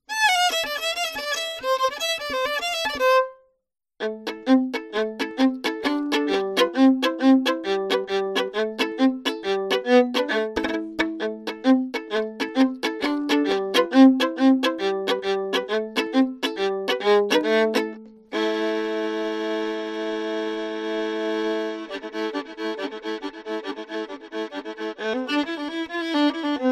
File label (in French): tarantella napolitana violon